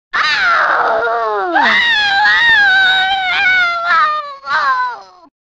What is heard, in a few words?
female scream